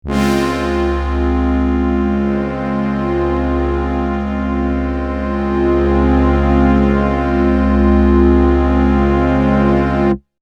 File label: Analog Vector, Prophet '08